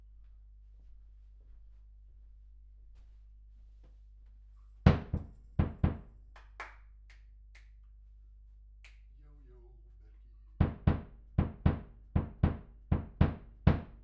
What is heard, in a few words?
Voc Omni